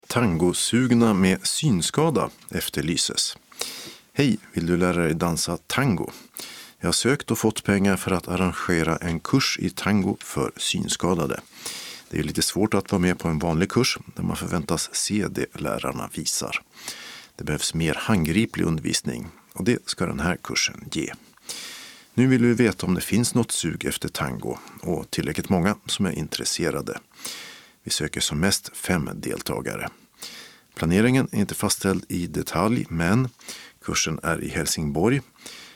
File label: tango